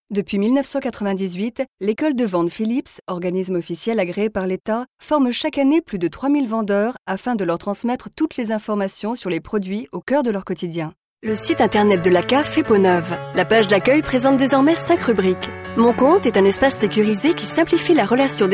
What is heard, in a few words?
corporate